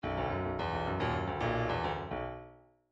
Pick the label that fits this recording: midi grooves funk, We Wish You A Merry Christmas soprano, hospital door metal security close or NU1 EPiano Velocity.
midi grooves funk